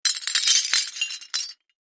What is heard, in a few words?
Wood Tile Lrg Squares, debris